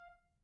Grand Piano Main Release F4, Kawai; Legacy

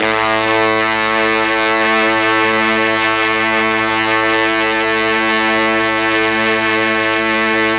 A1 brass, MKII mellotron

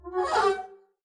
arco noise high medium, double bass; dsmolken; noises

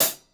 Hat Closed Hardest; hydrogen drum samples

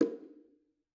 Bongo Hit Muted, Struck Membranophones; Bongos

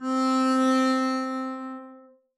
arco c4 f up, double bass; dsmolken

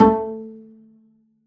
pizz a3, double bass; dsmolken

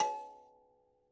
Mbira A4, Kalimba, Kenya; Plucked Idiophones

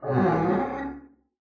arco noise moan, double bass; dsmolken; noises

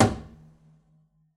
pizz noise body slap low, double bass; dsmolken; noises

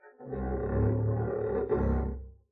arco noise creak, double bass; dsmolken; noises

